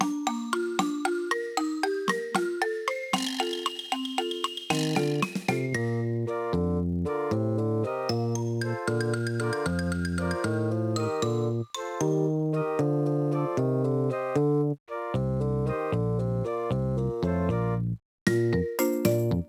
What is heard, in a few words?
Music Background Humpty dumpty; cocomelon play with jj; android